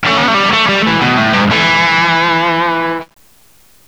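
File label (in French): guitare électrique; MOBICLIC 4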